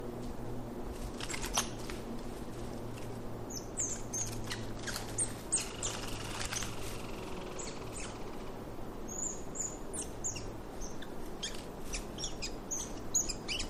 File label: American Red Squirrel Alarm Calls; Alaska; MAMMALS